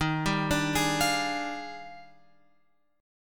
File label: D sharp Major 11th, guitar chord